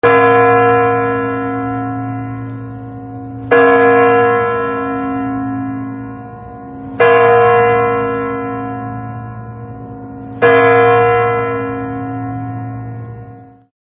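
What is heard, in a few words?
Sounds chime of Kremlin clock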